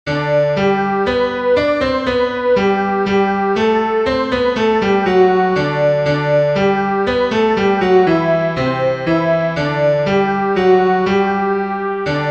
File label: ash grove soprano; let all things now living